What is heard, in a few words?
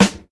solid snare sample g key, Royality free acoustic snare sound tuned to the G note.; snare drum samples